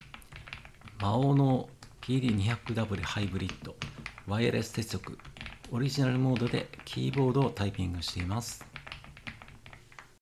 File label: maono pd200w hybrid Wireless typing sound